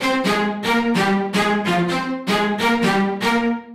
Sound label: Stacc Str 128 C, uk garage, Synths; 128bpm Lines n Loops